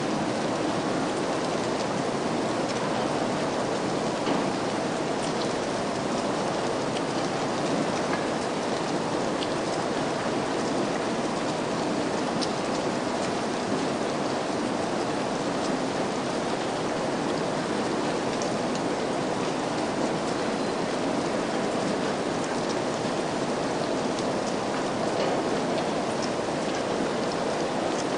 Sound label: Rain Thunder Storms